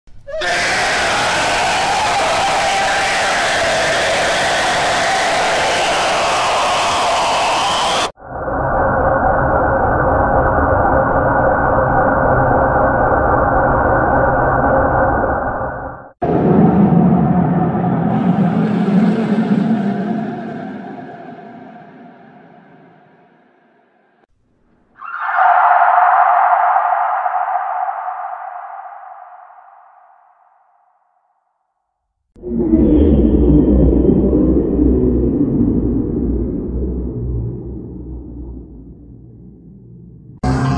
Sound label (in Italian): suoni horror effetti sonori horror suoni che fanno paura horror